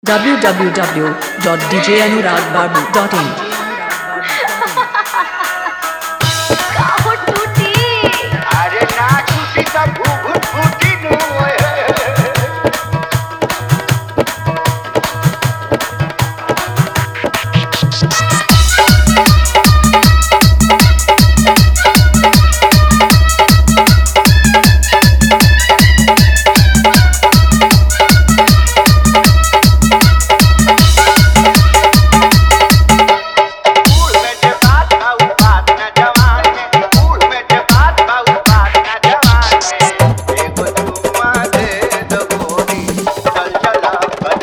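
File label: Hard Vibration Kick Dhollki Mix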